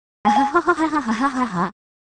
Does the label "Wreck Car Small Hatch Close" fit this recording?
No